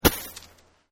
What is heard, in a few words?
snow impact; phineas and ferb; snowbrawl